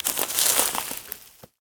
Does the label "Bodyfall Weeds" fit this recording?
Yes